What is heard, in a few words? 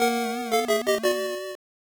Defeat; Pepe's Puzzles; Pico